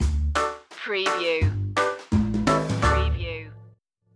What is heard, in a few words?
party barn banjo stinger